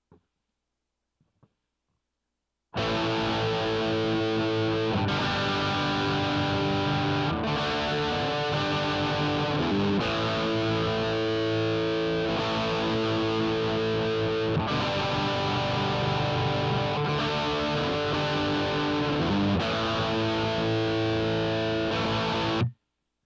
guitar hackery in A; admiralbob77

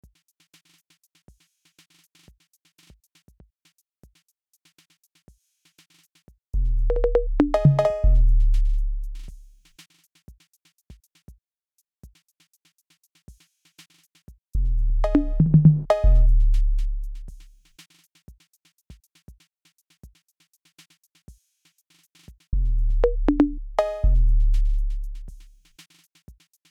808 Groove

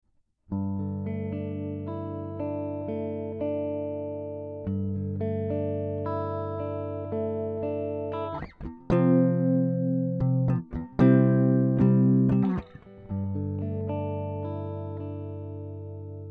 Snow riffs

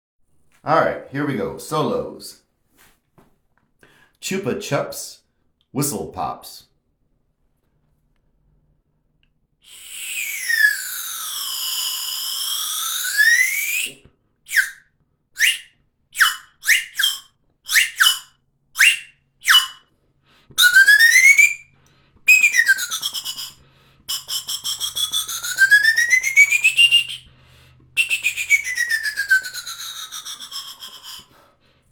Whistle Pops; Chupa Chups

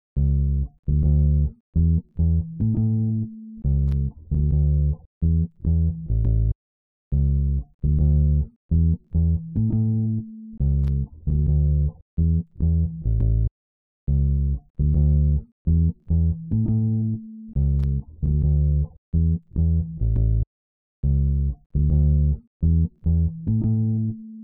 bass

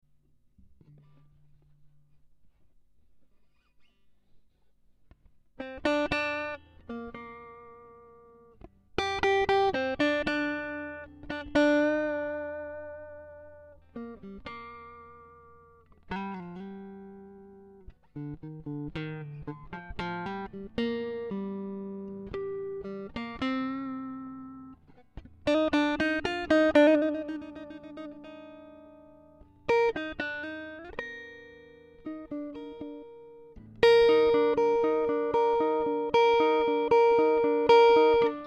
Groove

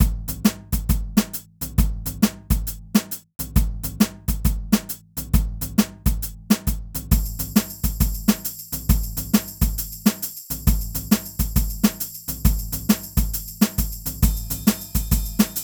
Jazzy Beats and Percussion 135 - loop soft

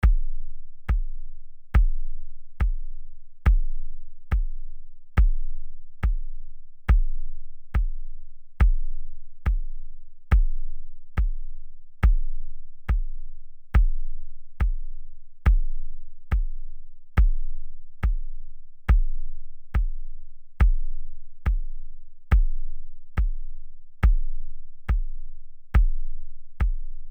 C 140 Acoustic Groove

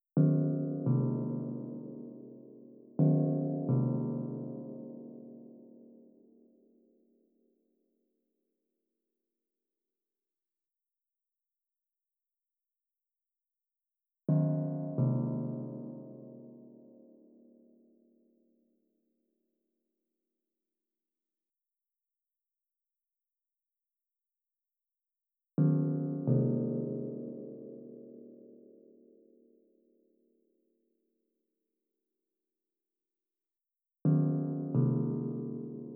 Gongsy, Ambient; Wiseman

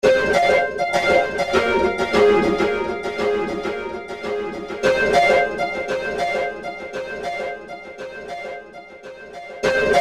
Noisey Mud and Plucker

